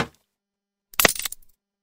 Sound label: wood splintering crack